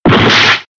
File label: word fail; typing; tf2ware ultimate